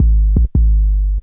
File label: Boo Bass - 1A; Jungle; Blu Mar Ten; Jungle - 1989 to 1999